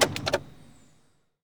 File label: police car stop int; Original TK Sounds